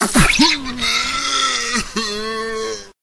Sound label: boss dead; kill the virus